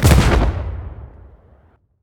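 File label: grenade impact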